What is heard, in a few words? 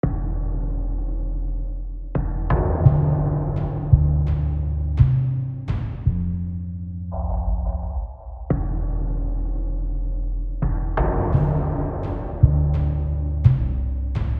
Tension